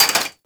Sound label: Cafe Chair Short; Warframe; Nineteen Ninety Nine